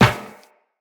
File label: Run Metal Perforated, Footsteps; Tenno